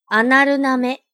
アナル舐め

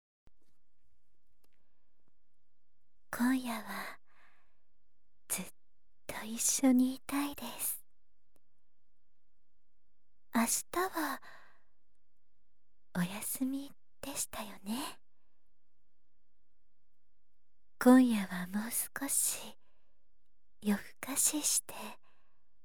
ゆったり耳かき, ASMR

